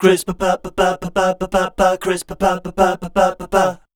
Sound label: SCAT, Voice Spectral